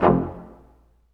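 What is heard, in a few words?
brass quintet staccatos; Roland L-CD702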